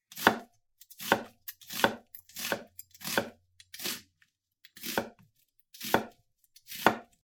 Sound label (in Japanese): 玉ねぎを切る音